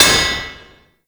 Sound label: Worker Hammer Impact Metal; Warframe; Grineer